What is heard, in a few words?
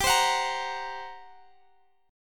G Minor Major 7th Double Flat 5th, G